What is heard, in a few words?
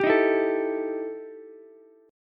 E Minor Major 7th Double Flat 5th E Ukulele; strummed; JGuitar